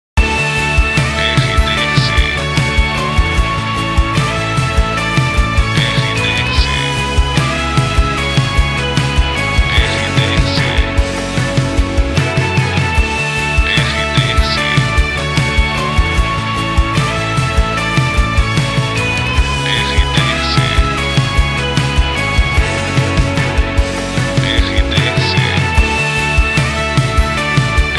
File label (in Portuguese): trilha para locução; Rádio RBC